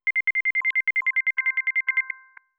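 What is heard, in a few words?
granular kalimba accent C major; accents; bespoke - Floral v.2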